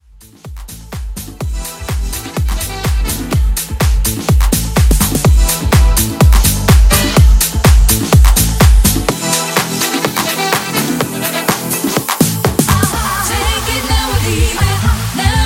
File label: 70s Pop; Remixfreakz